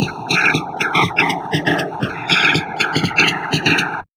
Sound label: DIST, Drum Loops Crazy Processed; USB Soundscan vol.07; AKAI